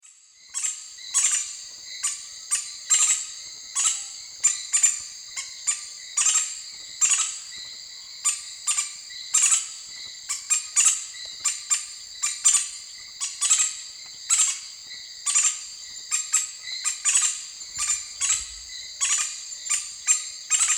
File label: Campephilus melanoleucos, call; S. America; Eastern Lowlands